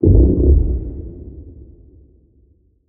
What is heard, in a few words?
Iceshark attack; Barotraumatic